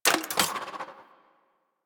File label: Spear Mech, Weapons; Warframe; Tenno; Priest Spear